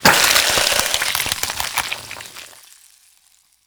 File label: Infested Crawler Nauseous Spill